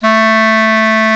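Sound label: clarinet; CMI Sound Library Rev 1.3; REEDS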